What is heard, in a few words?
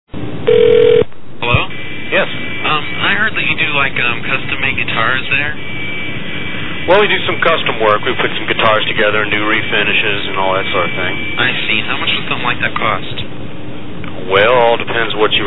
Guitar; Phone Losers of America; Roy's Guitar